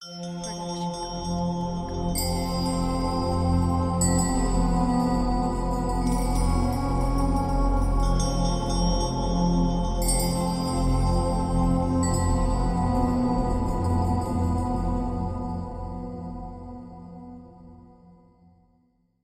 halloween retro theme; soundscrate

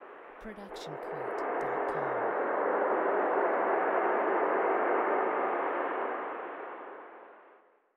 distant rocket exhaust; SoundsCrate

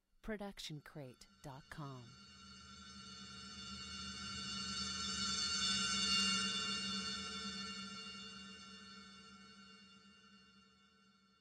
scifi gleam

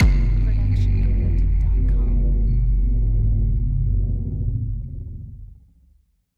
cinematic hit downer; soundscrate

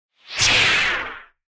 lightsword turning on; soundscrate

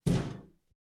Metal Footfall; soundscrate

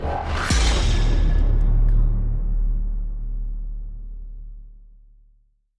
Bass Drop; SoundsCrate

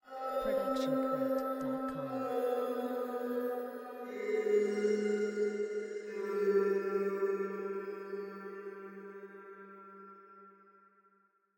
spooky melody; soundscrate

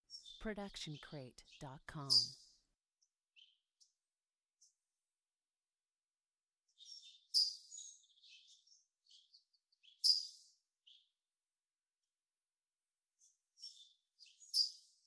Multiple Birds Chirping; SoundsCrate

